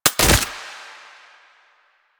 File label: AK47 Mech; Warframe; Tenno; Weapons